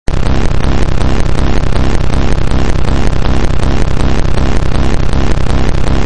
glyuk oshibka

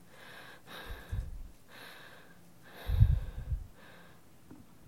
dihanie

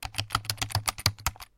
profesionalnaya bistraya rabota na klaviature

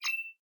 tonyusenkij ele slishnij zvuk

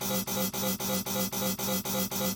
elektronnij signal trevogi

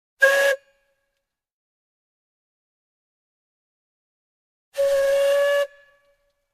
korotkij i dolgij svist parovogo traktora

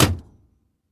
metallicheskij korotkij hlopok zakrivshejsya dveri

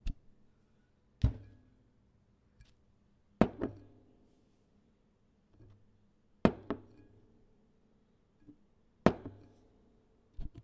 razmeshenie steklyannoj butilki obemom 500 ml na stole s tkanyu i neposredstvenno na derev; derevyannom

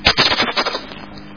zvuk strekotaniya mashushimi krilyami

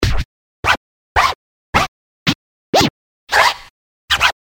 zvuki skretcha na sintezatore

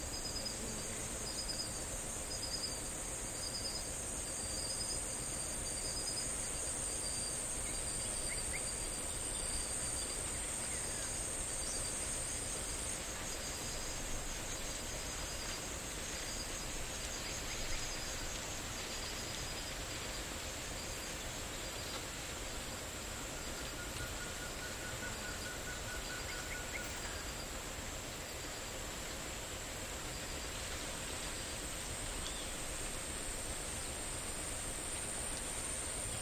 otdalyonnie spokojnie bezmyatezhnie zvuki ptic

